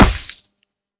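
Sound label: Bullet hit ground; Hovl Studio; SFX Battle sounds; WhiteMan Unity2D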